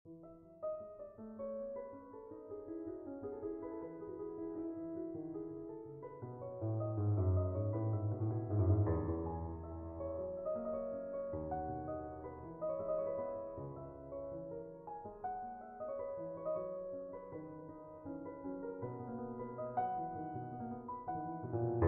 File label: Invention in E Major